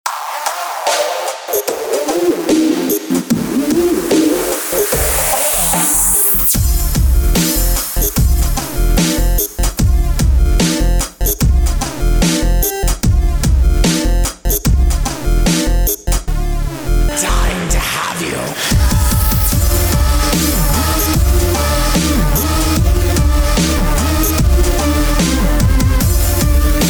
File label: Horror